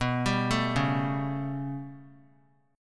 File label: B 7th Suspended 2nd Sharp 5th down, chord; B7sus2#5 arpeggiated; JGuitar